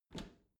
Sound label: bathroom cabinet open regular; objekte; tueren